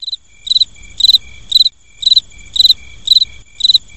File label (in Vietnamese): Nhac chuong tieng de keu